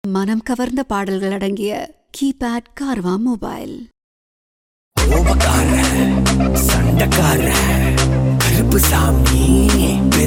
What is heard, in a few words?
Electro House Mix